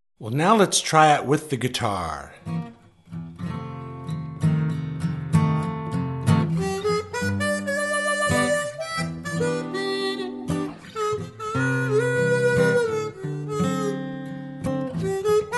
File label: Oh Susannah Blues Acoustic, Harmonica